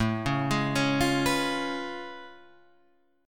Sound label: A Augmented Major 9th A down Guitar Half Step Down